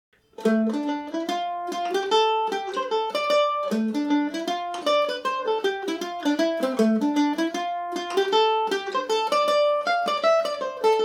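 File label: D dorian, Irish mandolin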